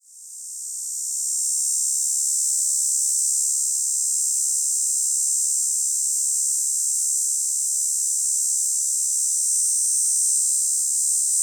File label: Hadoa texana, cicadas, filtered; US; TX; East of the 100th Meridian